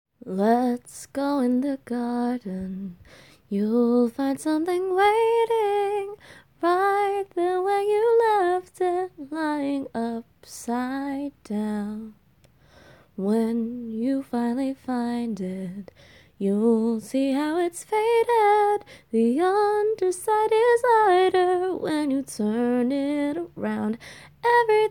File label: song cover, voice, a capella